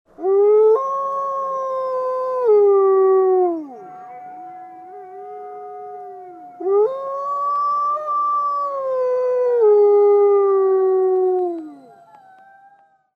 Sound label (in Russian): volk v seme